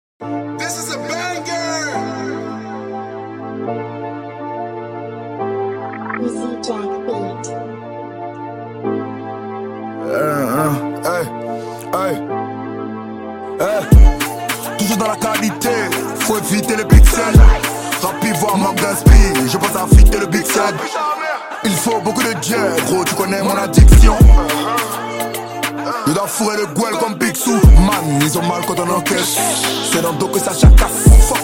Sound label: drill rap freestyle